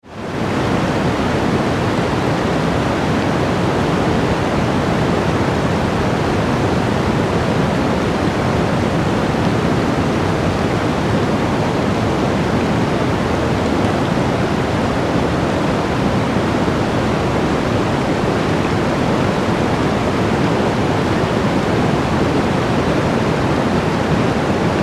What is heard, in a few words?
Blizzard, Forest, Ambience, Trees, Creak, Wind; 5.1